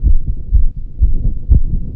HEARTTHROB; Spectrasonic Distorted Reality 2

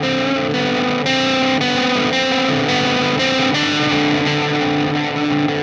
Static FX Guitar

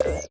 zap; Roland MC-909

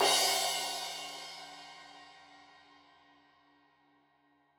Crash 15in L edge, Cymbals Stereo

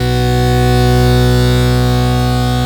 Ana Buzz

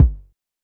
Polite kicks F#1, Short; LIMAT Div Dept; Drums

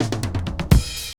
120 BREAK, DRUMS

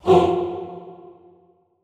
HOO F2, Classical Choir SHORT HOO; Best Service; Extended Classical Choir

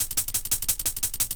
cool hats, breaks

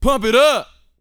VOX F, Vocal, R&B Dance; Spectrasonics Vocal Planet

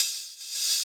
Open Hhat, Hihat; Equipped Music; Loungin House

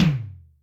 Hybrid, Electric Tom, Yamaha DTXpress

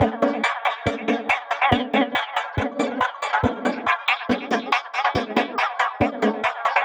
chanters, Vocal Loops; Transmission-X

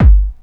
kick techno, drums; Techno Trance Essentials

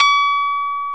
KEY MKS20 E, Pop Pno+MKS

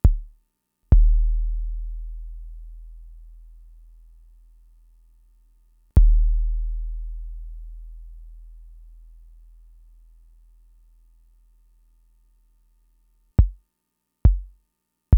Fricke MFB-522 KICKS

